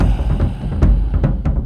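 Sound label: Music Shield; From Dust; ps3; xbox 360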